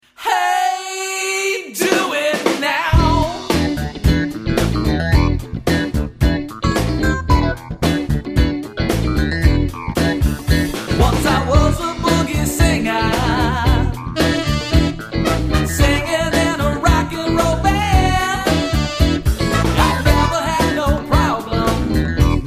Funky Music, Dance Band

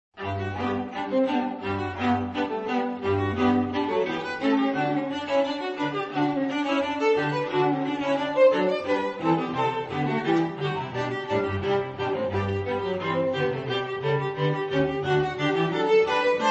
String Trio

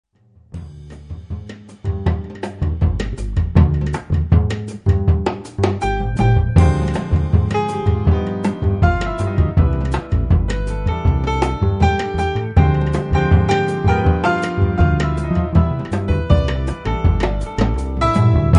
Quartet

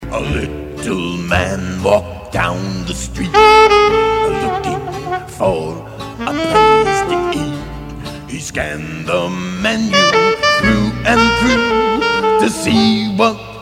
Dixieland Jazz Band; 20s band

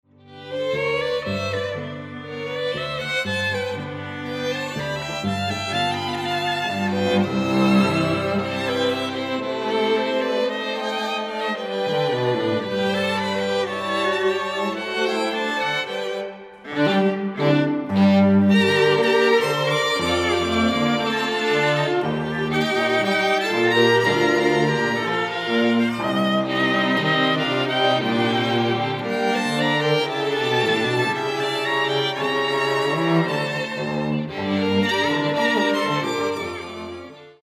Tango, string quartet

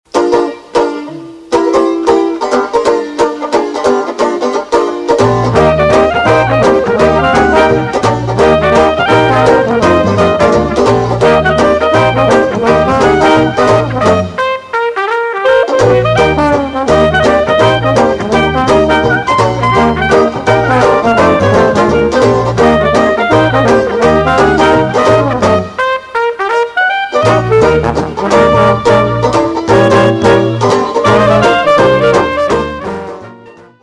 Dixieland Jazz Band; 20s band

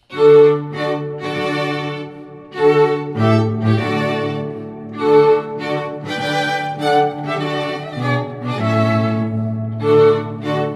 Bridal Chorus, string quartet; Wagner

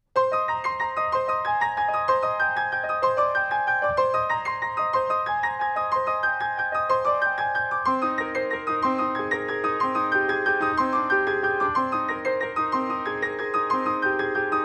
classical piano; Personal Composition

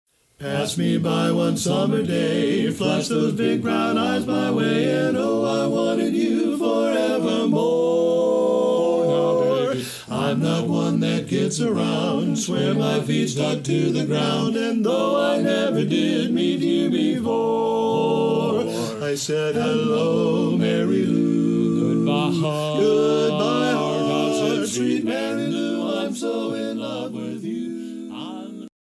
Barbershop Quartet

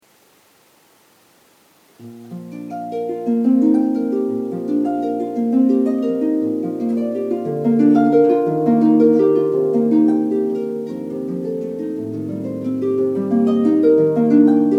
Reverie, Harp

